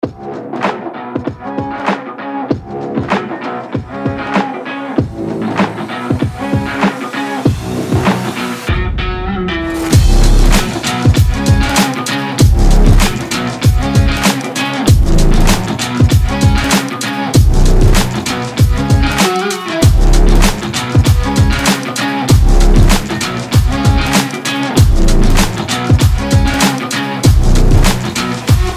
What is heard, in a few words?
stock music